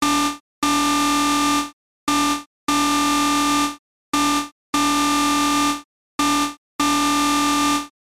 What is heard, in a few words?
Danger Alarm